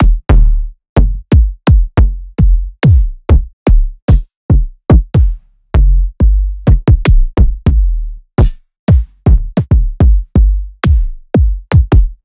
Two Shots Kick; Sliced

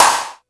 Misc Snare; EMU MoPhatt

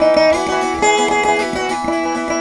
VEENA; Spectrasonics; Heart of Asia

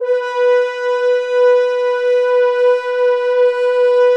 French Horns

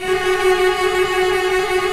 CELLI M TRLL; OMI - Universe of Sounds

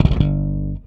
Slap One Shot G, Bass; Bass X

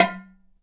Sm Dmbk; Digital Sound Factory

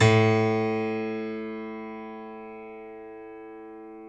PNO Grand Pno; Club-50 - Foundations Roland

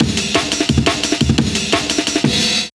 DNB BREAK; m8 breaks

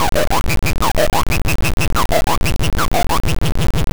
LFO, Noise

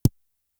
Tom, Toms; Fricke Schlagzwerg; drum machines

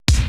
80S DRUM SET

